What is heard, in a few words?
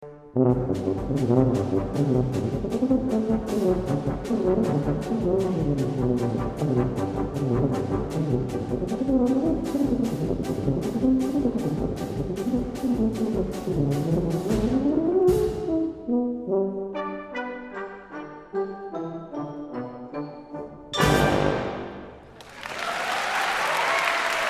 Polka